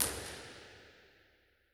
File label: Date Packing Wearhouse R; Bigger Spaces; Impulse Responses; Ableton Live 12 Lite